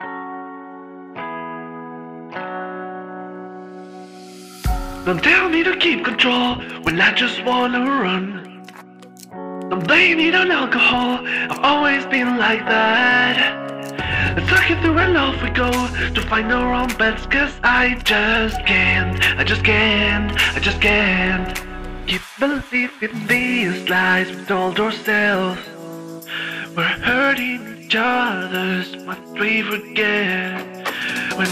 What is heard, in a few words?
Instrumental